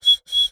Bird whistle, clay, painted, dry, airy, wispy, burst, medium, double; Eiravaein Works; Vaeyan IV